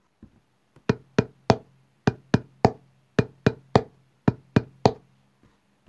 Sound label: rhythm